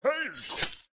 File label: Guard; Blind Quest - The Frost Demon